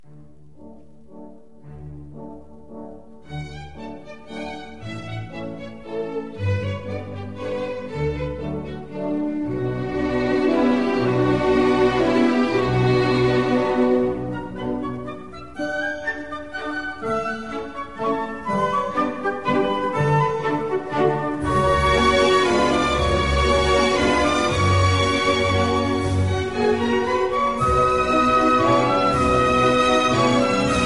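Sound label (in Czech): Slovanský tanec D dur Allegretto Scherzando (Sousedská), varianta pro orchestr; č.6; Antonín Dvořák; op. 46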